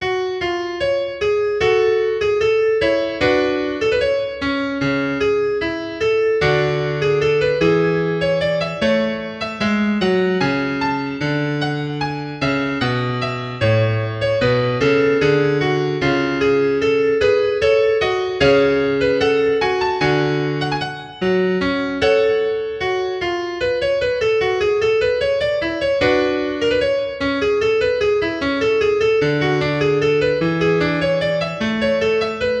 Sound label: Courante; Michelagnolo Galilei; Johannes Aegidius Berner de Rettenwert in Lampotin; Praha IV G 18